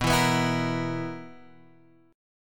B Major 7th Suspended 4th, guitar chord

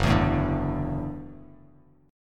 Gb Minor 7th Sharp 5th, Bass Standard; strummed; JGuitar

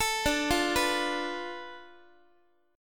D Minor 6th Guitar; Dm6 arpeggiated; JGuitar

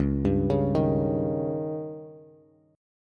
Db Suspended 2nd Flat 5th, Bass; arpeggiated; JGuitar

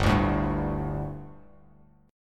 G Suspended 2nd G, down, Bass Standard; Gsus2 strummed; JGuitar

